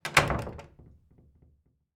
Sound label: Door close